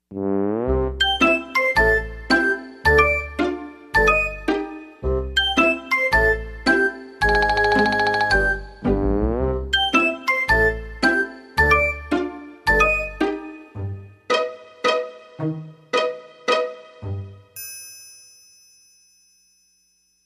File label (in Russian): muzyka dlya detskih video